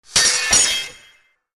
sword clash

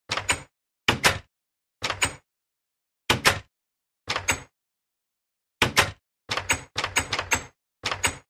minecraft door sound effect

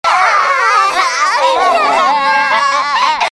crying sound

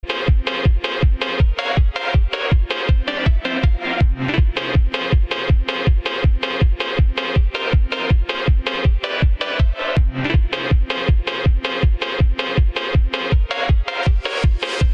phonk

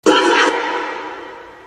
pop

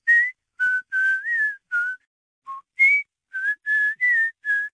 paper girl whistle; sims 1; nancy

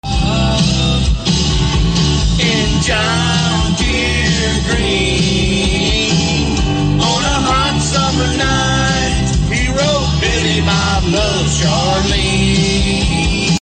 singing

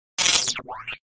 glitch

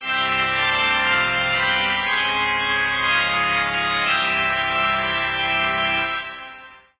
organ

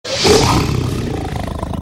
rrrrrrr

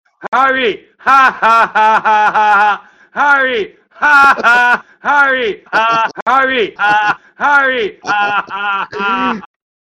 laughin

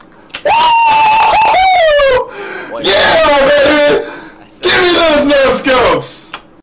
epic rage

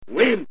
win sound effect; street fighter

